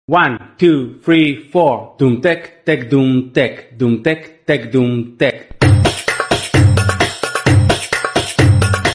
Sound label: trending remix; instagram ringtone